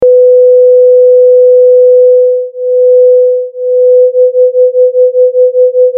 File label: beat